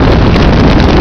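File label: flame loop; uhexen2; siege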